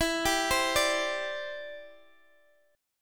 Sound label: E Minor 7th Sharp 5th E down Guitar DADGAD; arpeggiated; Em7#5